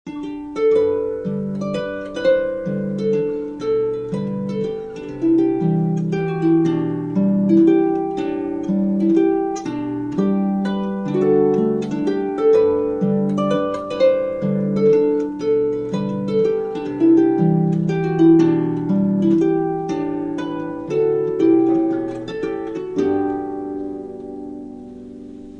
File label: waltz